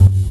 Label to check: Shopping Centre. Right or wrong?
Wrong